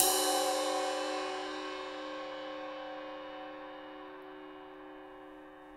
Ping Ride up3; EMU PX-7

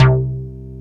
MOOG; OLD SYNTHS; Rubber Chicken Software Sample Bank

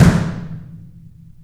K AMB, Drums & Perc; AKAI S6000 CD-ROM - Ultimate

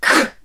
NOISE, CLASSIC VOX; Best Service; Hallelujah

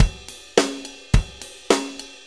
4-4 Loop, Drum Loops, Full Kit Loops; Drum Tools; Sonic Foundry (Sony Creative Software); Loops & Samples Drum Tools

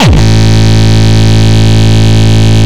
Longkick, kicks

